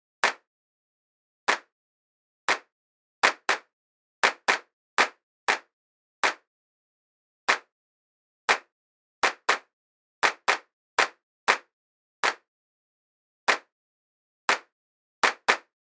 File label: env, reverb duck; ducking; too wet